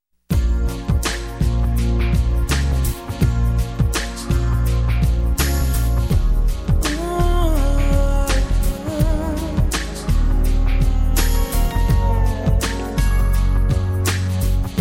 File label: Beaty Song